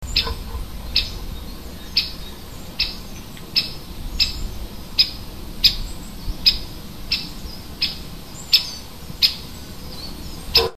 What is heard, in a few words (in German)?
buntspecht; vogel; hecke; biologie